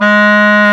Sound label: WND HARD, Clarinets; Clar Bryt